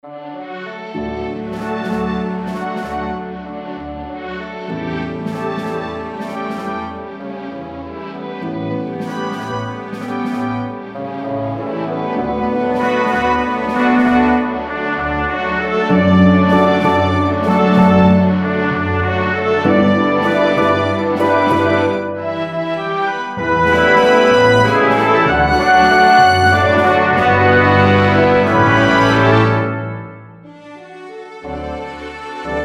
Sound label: Classical Blue Danube